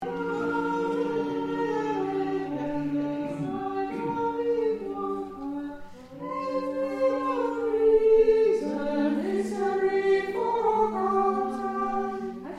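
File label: Soprano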